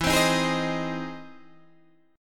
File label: E Augmented Major 7th E down Guitar DADGAD